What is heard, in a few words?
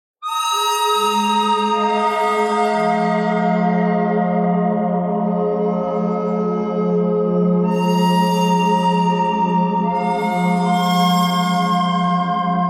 bird bird thread noise all; birds